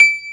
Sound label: Acoustic Piano D7; soundbank; instruments